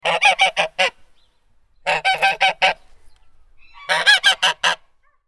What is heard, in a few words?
goose honk sound